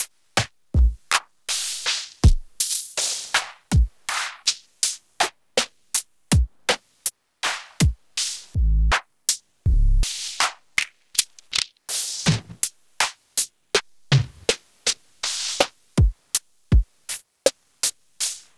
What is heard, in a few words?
percussion samples